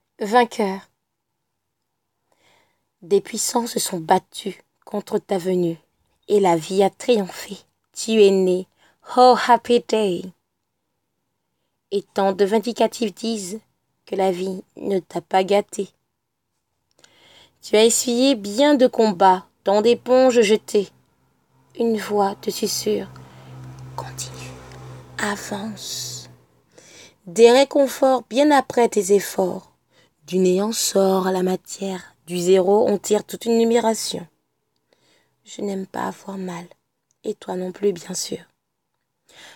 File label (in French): vocal